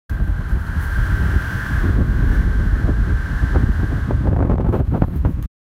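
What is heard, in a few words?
wind lowering as door gets, lowering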